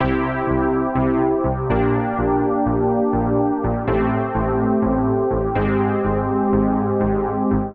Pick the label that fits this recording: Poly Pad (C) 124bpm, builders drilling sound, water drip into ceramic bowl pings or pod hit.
Poly Pad (C) 124bpm